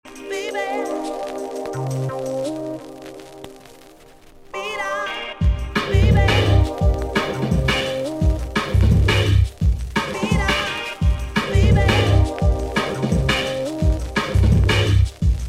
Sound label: dnb; 2000 era